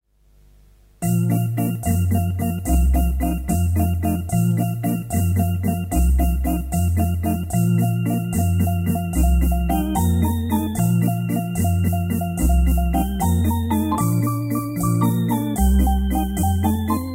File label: t200; Hammond; 1970